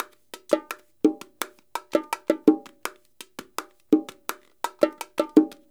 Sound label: LOOPS, NYC Percussionworks; Sampleheads; ER LOOPS 84